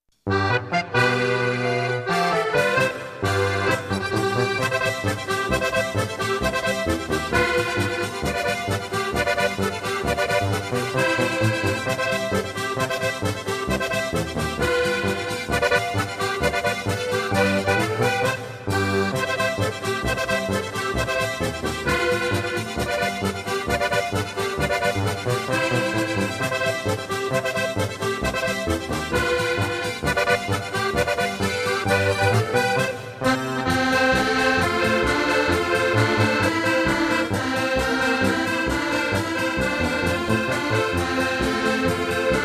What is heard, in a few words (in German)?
trompeten echo kurz